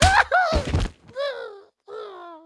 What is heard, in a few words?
Hi Merling Dead